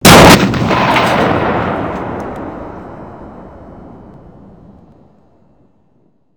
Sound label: TNT